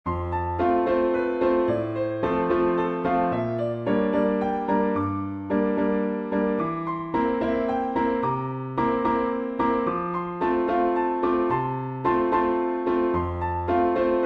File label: PIANO